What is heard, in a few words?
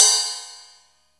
RIDE; DMX Cymbal/3 Ride 1 of 4; Oberheim drum sound chips